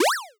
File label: jump high